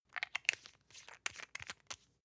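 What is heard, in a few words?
toothpaste close rattle, Bathroom; haus-garten; badezimmer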